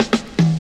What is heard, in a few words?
Fills, Roland; Sound & Vision - Gigapack I; Perc